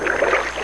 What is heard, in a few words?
water paddle; GAMECUBE; SUPERMARIOSUNSHINE